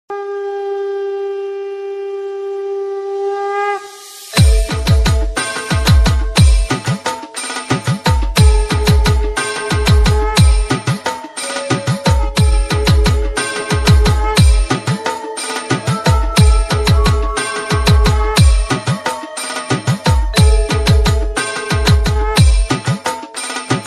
navratri ringtone, durga puja ringtone, bhaakti ringtone